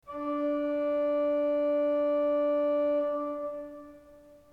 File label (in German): ton, orgel